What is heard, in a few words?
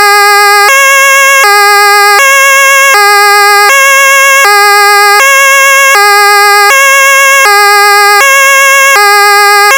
pintsch bamag DIN Stadt